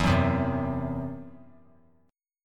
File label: E Suspended 2nd Sharp 5th, Esus2#5 chord; strummed; 16,13,15,16